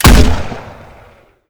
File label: pressure gl shoot; tf2